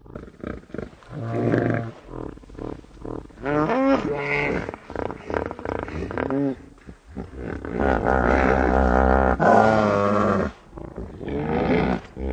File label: squid idle